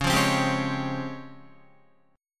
Db Major Sharp 11th, Guitar; strummed

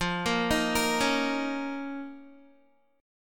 F 7th Suspended 4th Sharp 5th F down Guitar; arpeggiated

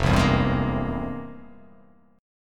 F-Minor Major 11th, down; FmM11 strummed; JGuitar

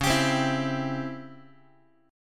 C sharp Minor Major 7th Flat 5th Guitar; C#mM7b5 strummed; x,9,10,10,8,x; JGuitar

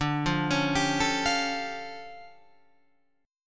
D Minor Major 11th down Guitar Open F; DmM11 arpeggiated; JGuitar

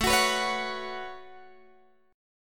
A 6th Add 9th, Guitar, Open G

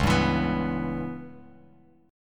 C sharp Minor 7th Flat 5th C sharp Bass Standard; C#m7b5 strummed; JGuitar

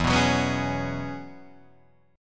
E 6th Add 9th E down Guitar Drop D

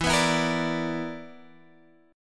B Minor Major 7th Double Flat 5th, chord

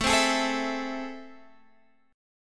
A Minor Major 7th, down, Guitar, Drop D

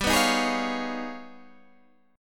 G sharp Minor Major 13th G

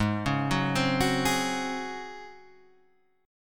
Db Major 7th Suspended 4th Ab

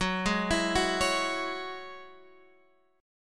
Gb 7th Suspended 2nd, Guitar, Standard; arpeggiated; capo 2; 14,11,14,11,14,x

